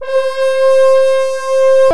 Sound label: FRENCH HORNS; OMI - Universe of Sounds